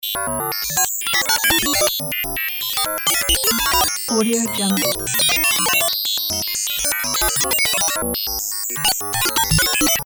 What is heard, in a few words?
futuristic network connecting